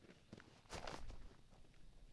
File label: step grass, footsteps